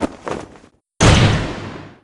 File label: leg slam; barok